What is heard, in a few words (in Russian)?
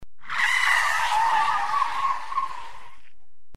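звук тормозов авто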